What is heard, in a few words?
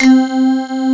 TIGHT KB, keys; East West - Global Sounds; S.I KEYS